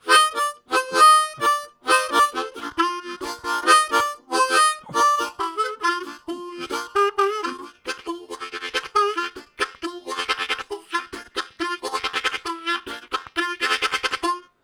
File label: Octo Jump, Harmonica; Zero G